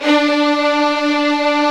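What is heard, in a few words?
strings, Vlns 8 fff slo; Roland L-CD702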